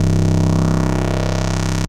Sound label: C Bass